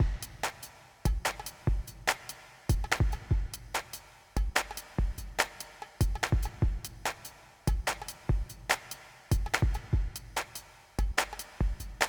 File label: penguin drum